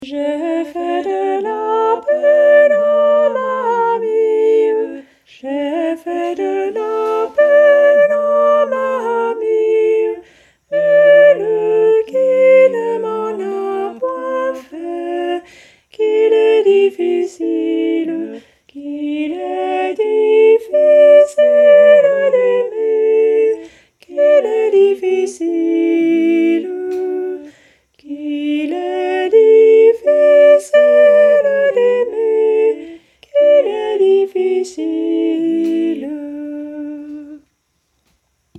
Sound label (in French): Sopranes; Le Doux Chagrin